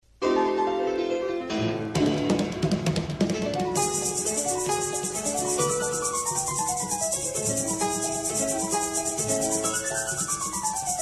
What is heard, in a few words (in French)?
Les rythmes argentins Percussions; Pierre Gabaye